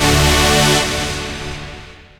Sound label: chord A; Techno Trance Essentials; CHORDS